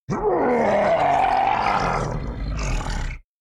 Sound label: Monster